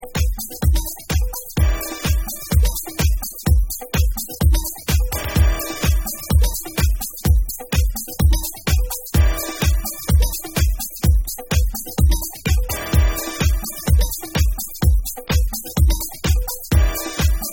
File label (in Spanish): RITMO, Dub